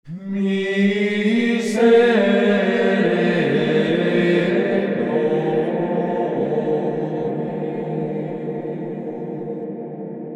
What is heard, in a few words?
Monks Reverb; Inner Space